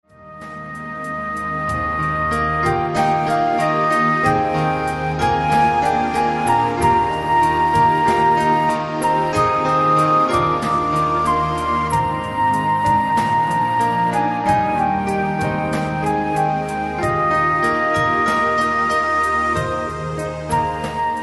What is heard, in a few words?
Dream Of The Flute